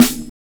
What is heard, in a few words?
Snare, Drum and Bass